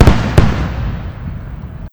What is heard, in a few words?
s blast, weapons